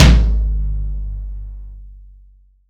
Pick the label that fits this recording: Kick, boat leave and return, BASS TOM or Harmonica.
BASS TOM